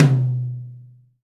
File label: low mid tom drum sound b key